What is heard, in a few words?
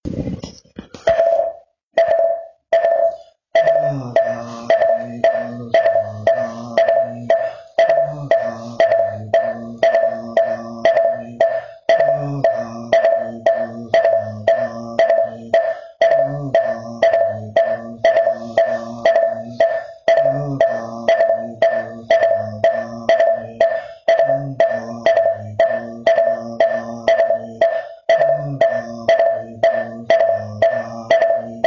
Moktak; Sogamoni bul; Ydinsuutra koreaksi